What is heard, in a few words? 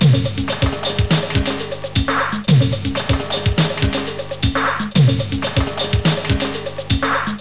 CONGA